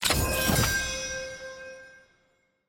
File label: notif role swap request rcvd; rcp fe lol champ select